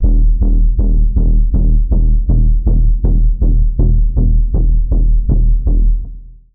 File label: Pulse; Routed Sound; Serum 2 Expansion - Quantum Enforcer Vol.1